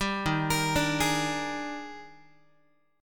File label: D sharp Major 11th, down, Guitar; D#M11; arpeggiated